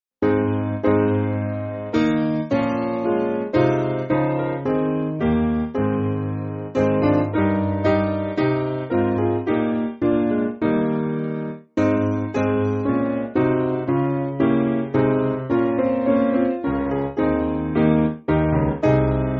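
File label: Piano, Backing Files for Churches; With Glorious Clouds; St Gregory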